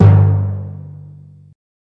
timpani hi; Western and Latin Percussion; single hits

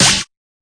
fx drum

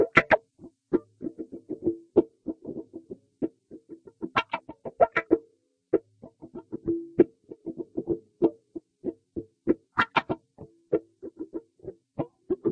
fukin with the wah, GUITARS

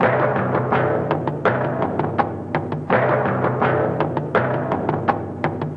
083 dirty kettle drums, drum loops

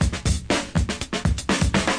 120 digital ripper break, drum loops and breaks

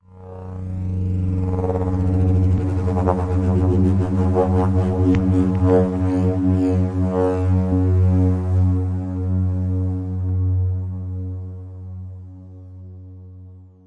approaching spindown synth

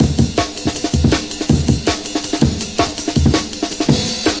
160 orig, DRUM LOOPS and BREAKS

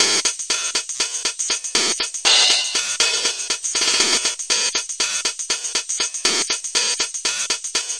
120 stretching crashy hats

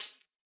classic hh H; STYLE KITS (Hip Hop, Etc); Classic Hip Hop Mega Kit

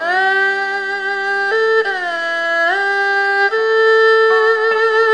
chinese traditional; WORLD

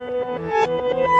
backwards, Keys Organ Piano; MELODIC SAMPLES

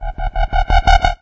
ominous bass stutter in; Basses

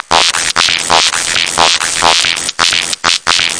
133 bpm, static jam, drum loops and breaks

